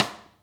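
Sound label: side stick, Interactive Drum Kits; Sonic Reality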